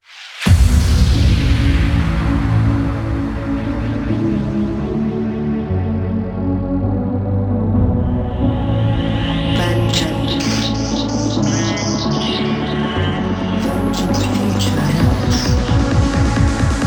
Intro 132BPM Mix

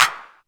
Claps; Vengeance Samples

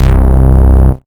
Bass C, Offbeat Bass

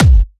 Kick 138BPM, Drums & Perc; Gminor

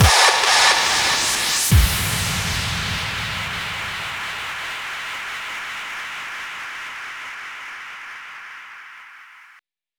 Male FX Mix, 140 BPM; Vengeance Samples; Vocal-Hook Kits; Song Kit; Stop!

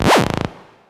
Synth Shots; Vengeance Samples

